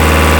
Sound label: alfa romeo low